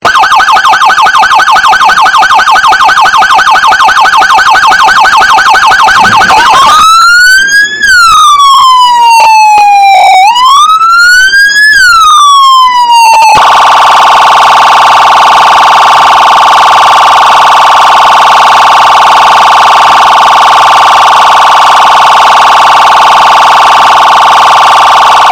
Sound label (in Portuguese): sirene de policia